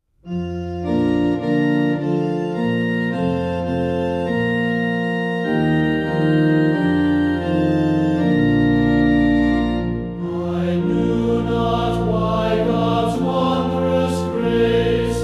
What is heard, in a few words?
Choir, Hymn, Organ; I Know Whom I Have Believed